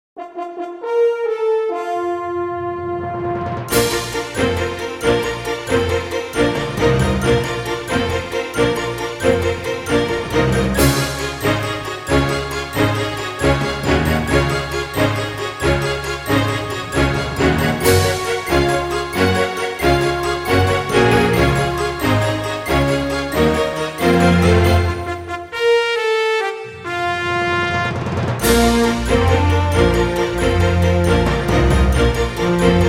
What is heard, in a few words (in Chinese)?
专题片背景音乐